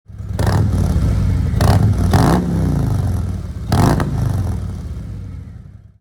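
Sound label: touring 2 1 mode, exhaust; mr hyde mode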